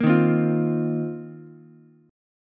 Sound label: C Minor 11th C Ukulele C6; strummed; JGuitar